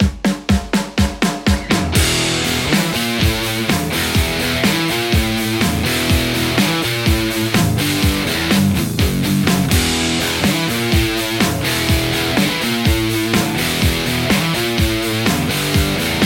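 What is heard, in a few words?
Stratocaster; nickcrow 8505 v1 2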